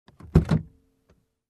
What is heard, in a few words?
Car Door Handle